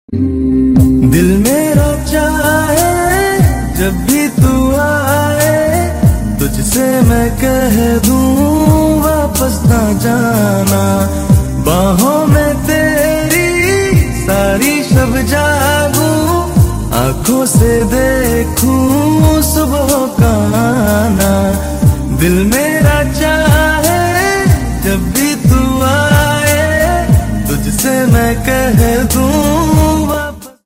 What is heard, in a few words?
Love Song Ringtone